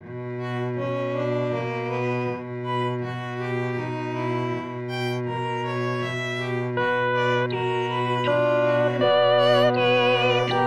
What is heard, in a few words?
Tutti; Bach BWV 232; Et Incarnatus